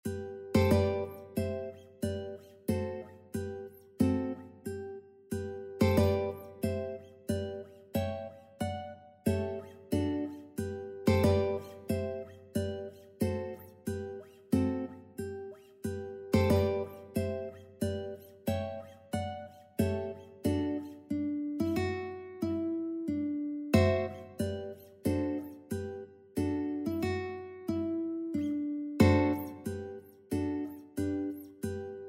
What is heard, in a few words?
ukebook soldiers march